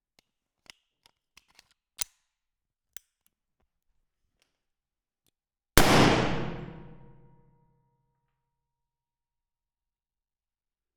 Stairwell Colt M1911 .45 ACP Pistol 00m On Gun Single Shot; DPA4062; Audiobeast; WEAPONS RECORDING PRIMER